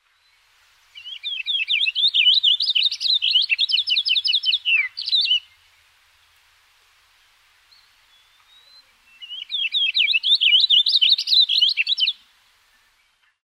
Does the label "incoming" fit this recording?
No